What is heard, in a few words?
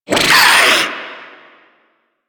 Swarm Vocal